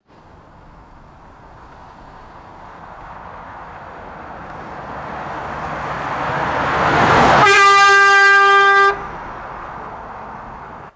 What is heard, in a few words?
fast vehicle pass by with horn; vehicles